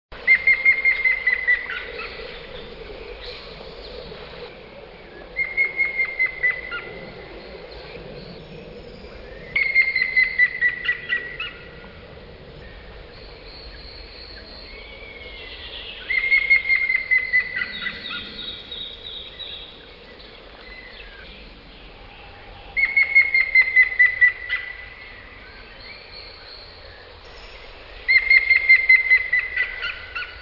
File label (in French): pic cendre cri; oiseaux; pics; parcours santé